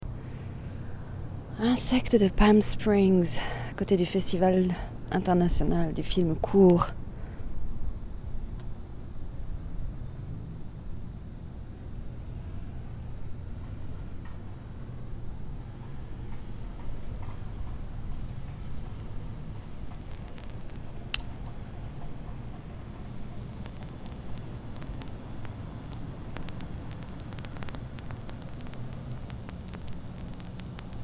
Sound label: palm Springs insects electrik